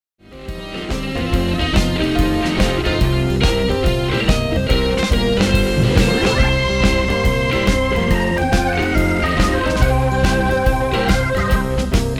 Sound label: Blues